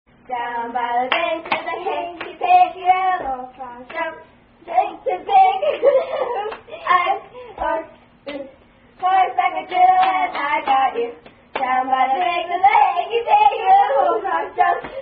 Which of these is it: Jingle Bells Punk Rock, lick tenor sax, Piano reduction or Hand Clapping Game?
Hand Clapping Game